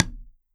Practice Pad Single Stroke

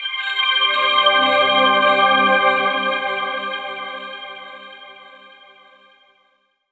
Yamaha TG500 ME Mello C2

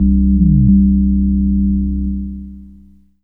E Mu Proteus FX Whales C1

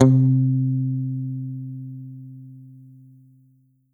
Yamaha EX5 Big Mute C3

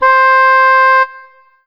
Alesis Fusion English Horn C5

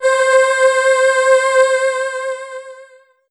Ensoniq ESQ-1 Synth Voice C5

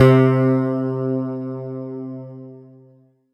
Roland PN-D10 Western Carnival C3

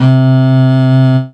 Casio CTK-611 Cello C3

